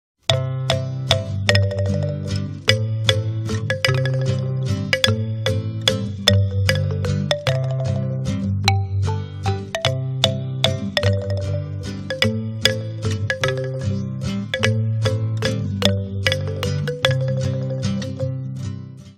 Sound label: Waltz; Drumcondra